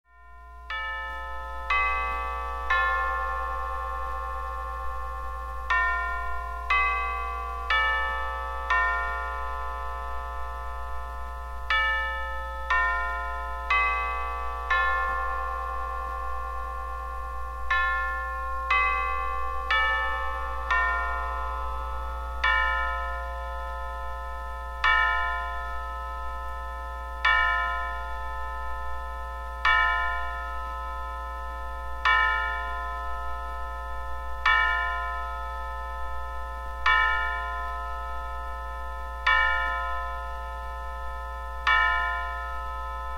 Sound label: Westminster 4 x 4